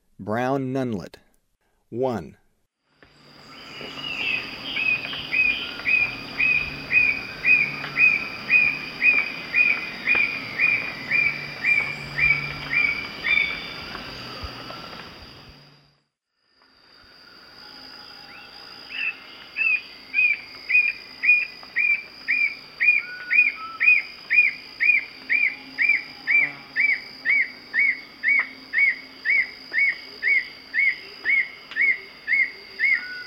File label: Brown Nunlet; S. America; Eastern Lowlands